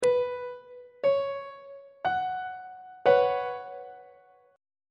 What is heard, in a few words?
a double sharp suspended 2nd chord; on treble clef